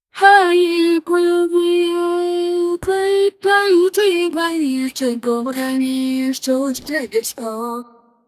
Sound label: woman singing in a catchy; vocals